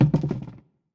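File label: Collision Traffic Cone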